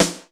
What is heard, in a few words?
NICE GUY SD, drum; Crypton - DrumBase5000